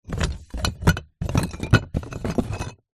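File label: plaster pieces in cardboard box rummage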